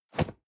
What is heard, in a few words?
cardboard box medium place slide; objekte